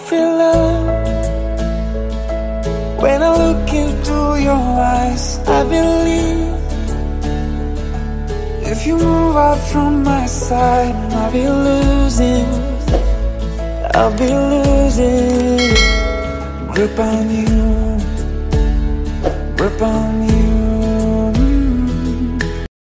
english romaic ringtone; english ringtones

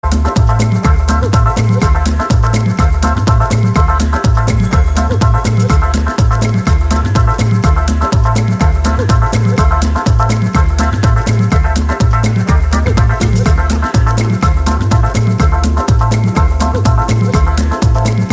music mix tone; awesome illusion

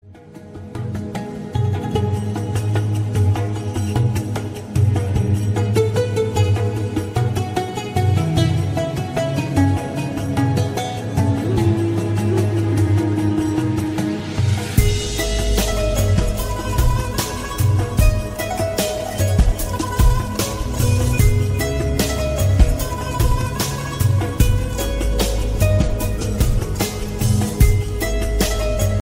sad ringtone

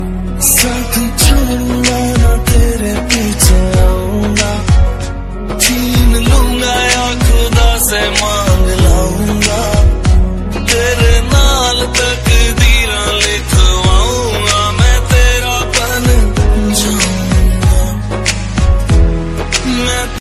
remix ringtone; english ringtones

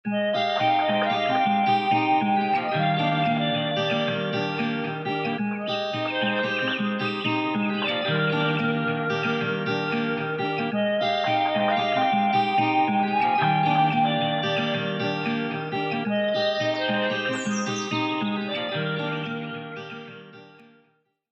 meadow ringtone, cool ringtones

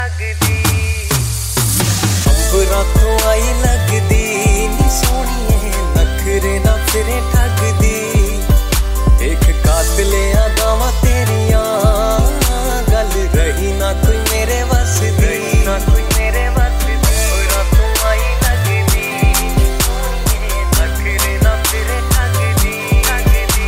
new punjabi song ringtone

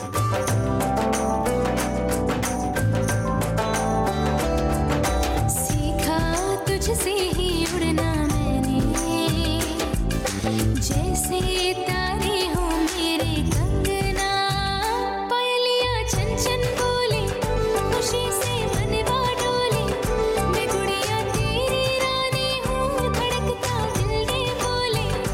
pakistani drama ringtone; angna

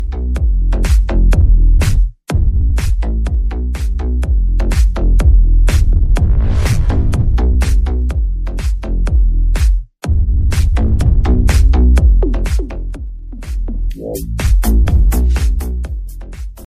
sound music ringtone, bollywood ringtones

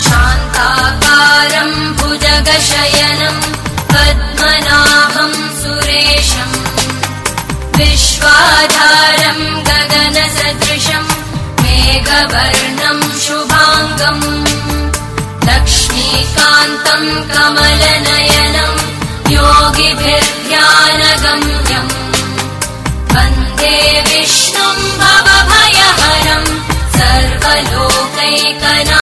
Vishnu mantra ringtone; bollywood ringtones

